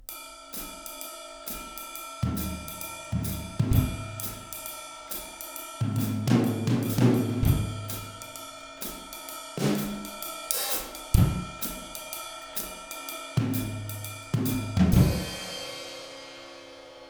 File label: 4 Piece 1 Mics, drum set